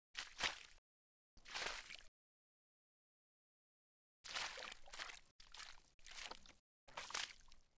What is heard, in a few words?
Falling Mud